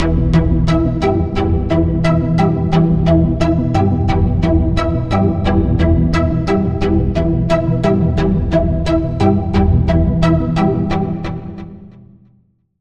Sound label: Arp, Serum 2; Arp - Cosmic Calculator; Routed Sound; Serum 2 Expansion - Quantum Enforcer Vol.1